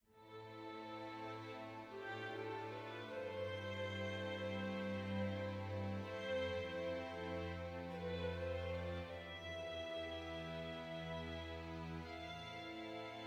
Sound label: sad soft; Quivr; PS4 Windows gamerip 2016